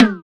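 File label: PER PROCU, Procussion, percussion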